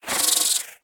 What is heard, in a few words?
Spider Aggro; The Inn